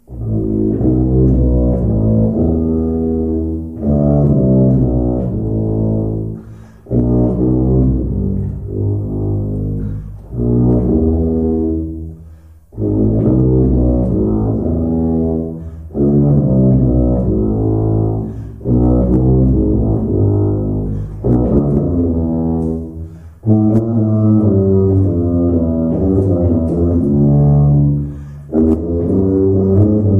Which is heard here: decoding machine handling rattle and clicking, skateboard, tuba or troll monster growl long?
tuba